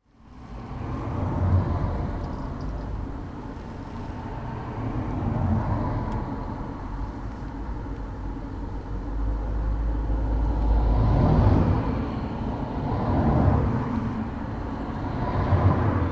ambience traffic pass by parked car internal recording